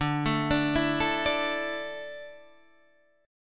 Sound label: D Suspended 2nd D down, chord